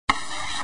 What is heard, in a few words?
Wave